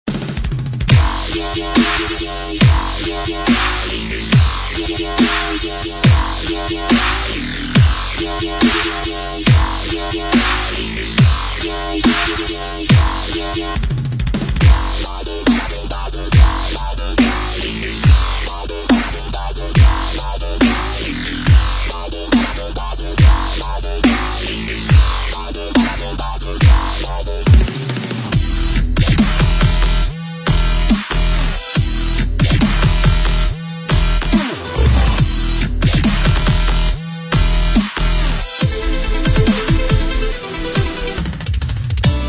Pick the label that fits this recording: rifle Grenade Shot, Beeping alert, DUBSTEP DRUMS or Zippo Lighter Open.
DUBSTEP DRUMS